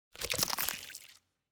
environment heart damage gore

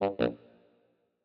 ui error

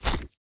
ui submenu open; Shelter

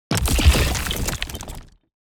building destruction build

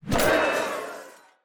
ui riot begin; Shelter

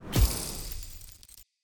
ui spelldraw correct

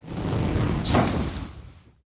npc fire golem strike

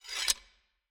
ui player sword put

